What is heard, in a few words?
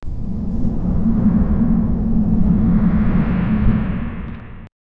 wind vortex 2d game